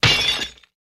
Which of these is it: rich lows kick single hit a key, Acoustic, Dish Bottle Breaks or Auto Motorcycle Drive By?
Dish Bottle Breaks